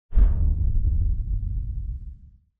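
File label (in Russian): удар; Звуки подводной лодки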